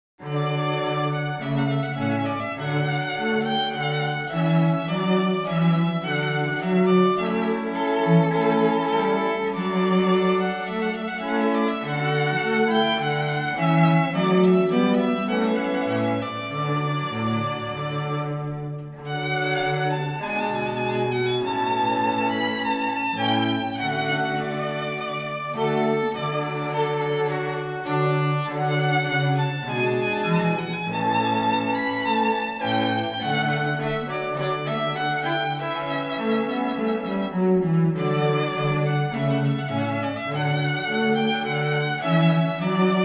String Trio

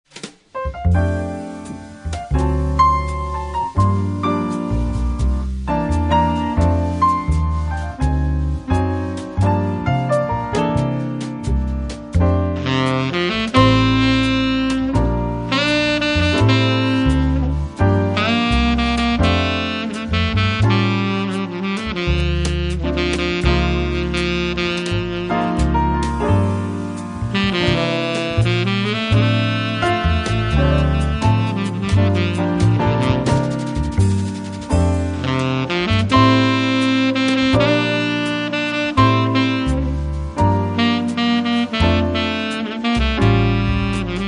Quartet

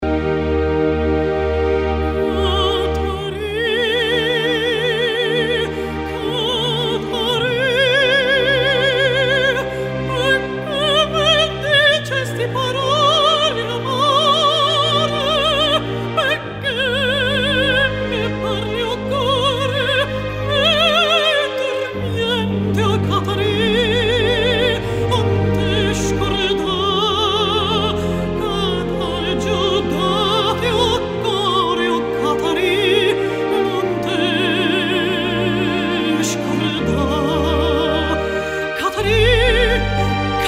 Italian singer; Core 'ngrato

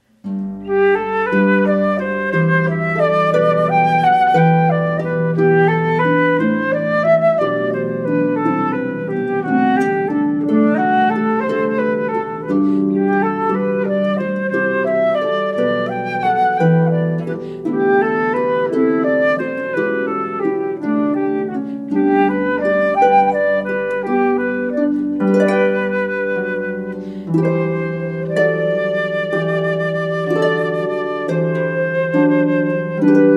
flute, harpist

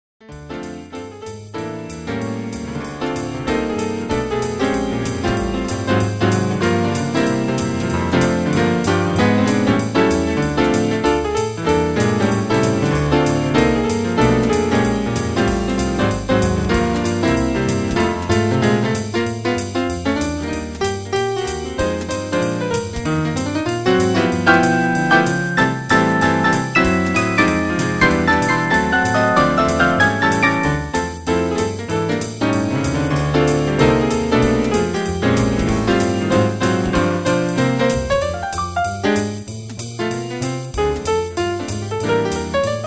trio, Jazz Band

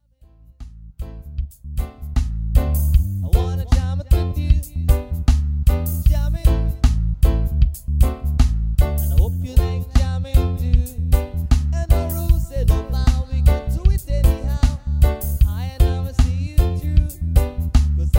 jamin, Steel Drum

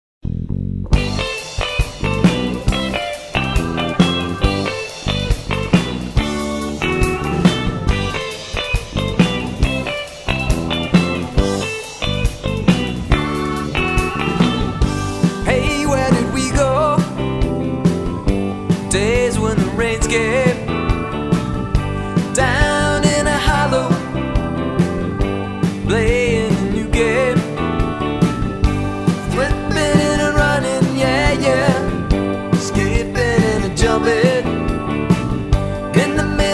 Live Band

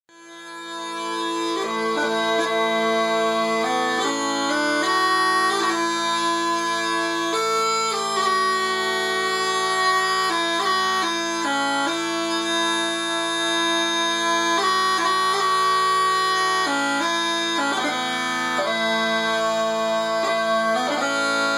smallpipes; Shenandoah; Bagpipes

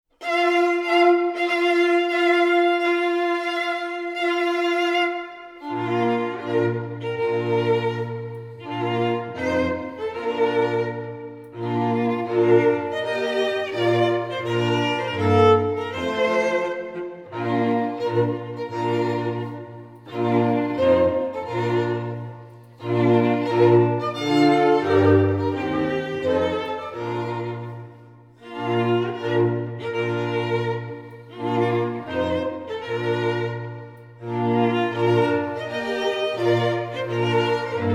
Bridal Chorus string quartet; Wagners

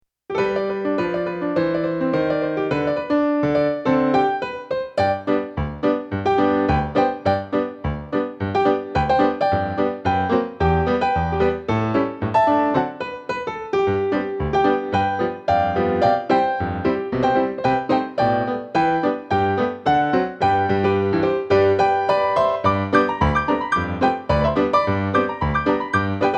When the Saints Go Marching In, piano; 40s Hits

